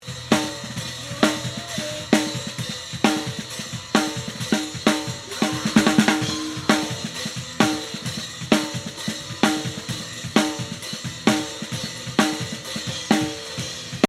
snare, le même melange Caisse-Claire dessus+ dessous; batterie

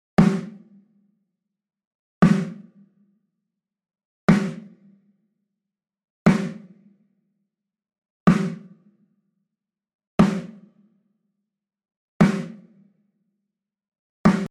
claire, batterie

